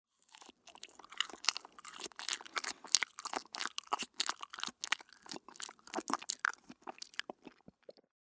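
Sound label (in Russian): zvuk chavkaniya, sound of chomping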